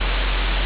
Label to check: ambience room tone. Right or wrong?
Right